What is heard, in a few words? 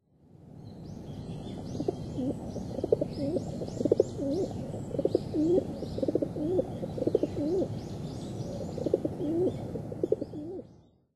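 dobato, Rock Dove; kunitachi; koe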